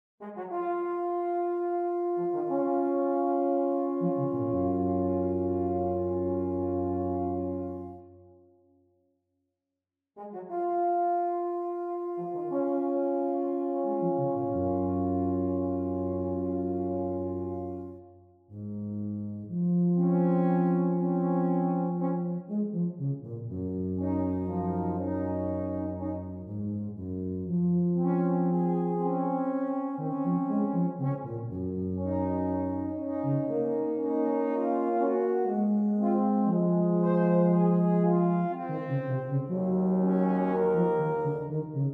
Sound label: Larghetto cantabile